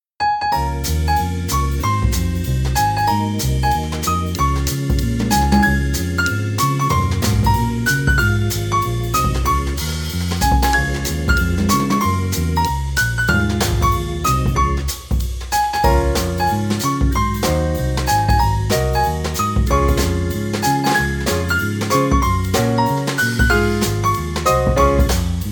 Happy Birthday To You Jazz

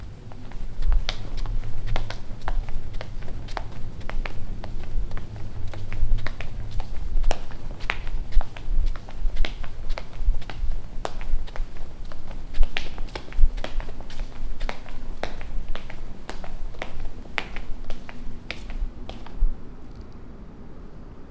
Footsteps tile

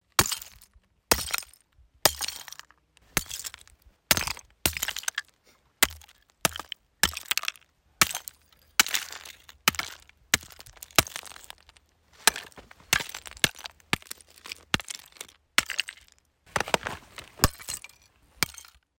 Break Ice

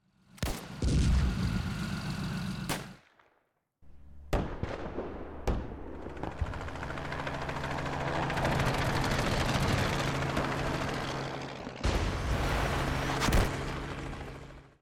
Battlefield 7 1 Surround

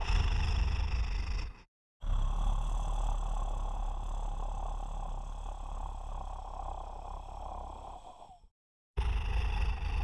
Monster Breathing

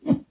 Swinging Staff Whoosh Low

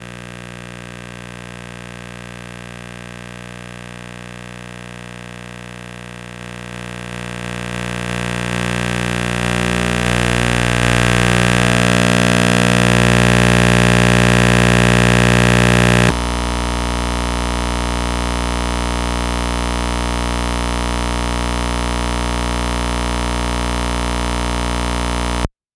Composite Video

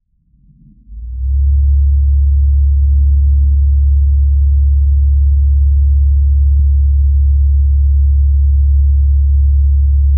Fujitsu Mhv2200bt; Klerrp

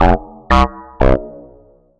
Rythm Robot

